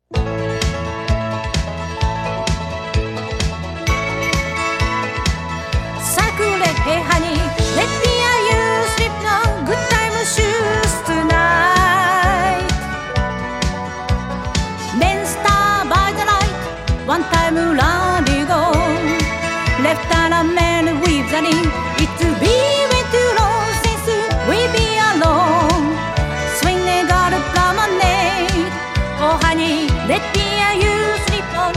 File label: good timin shoes vocal